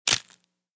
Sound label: Candy Bar Crunch Bite